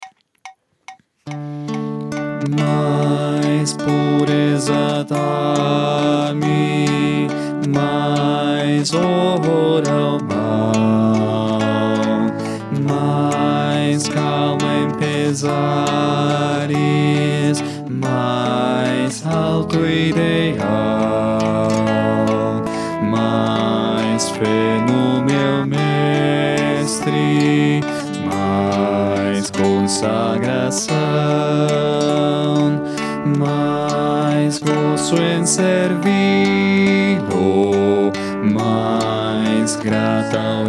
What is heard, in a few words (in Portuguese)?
Perfeição baixo